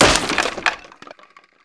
CRATE; kingpin

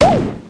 Bonus Pop; Hamsterball

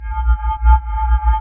stealth loop

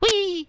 whee

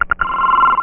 Incoming Ring; firetalk

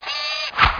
RO FIRE

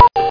blink; How Many Bugs in a Box; Mona

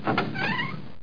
door wd op; landslor

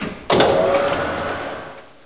Panel down

